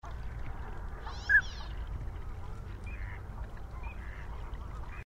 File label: Anas acuta, bird